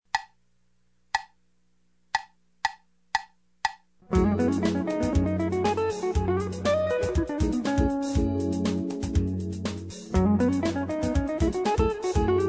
Jazz riffs minor riff; Mentors minor riff

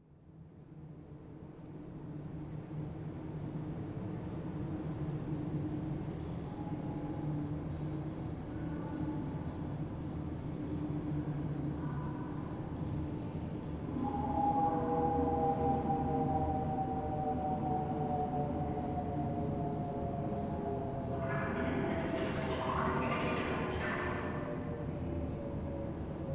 Train driver jam; Sonic Rupture

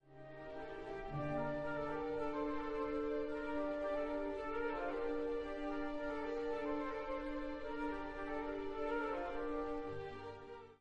Folk Dance Theme

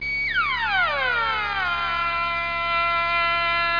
Sci-Fi

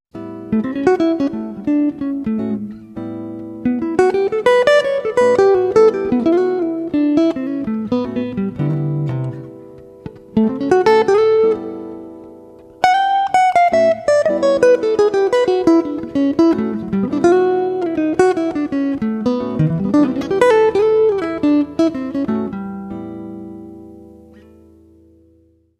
A major bebop lines

